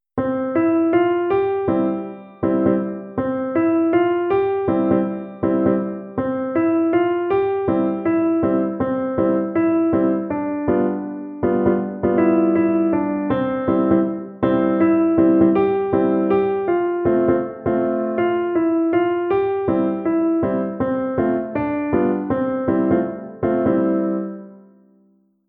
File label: Piano; Oh When The Saints